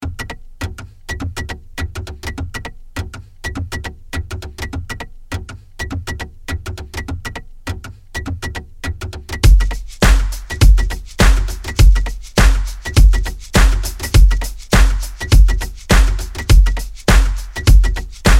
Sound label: Loop; thelooploft